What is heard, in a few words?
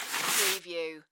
Chalkboard Eraser